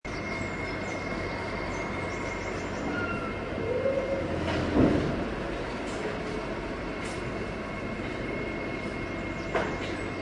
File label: siren